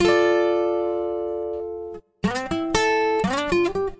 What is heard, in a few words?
simple acoustic guitar; GUITAR LOOPS